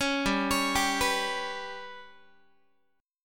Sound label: G Major Flat 5th Guitar, chord; GMb5; arpeggiated